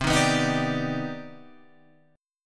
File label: C Minor Major 9th C